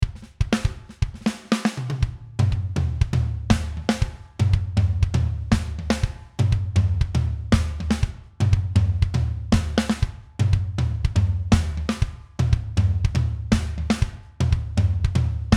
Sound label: Drums; Steven Slate Drums 5.5 Deluxe 2 Free Edition